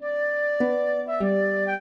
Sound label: trio, flute harp; Mozart